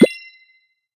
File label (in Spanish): Cerrar pop